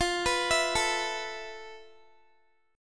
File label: F Major 7th Flat 5th, F, down, Guitar; arpeggiated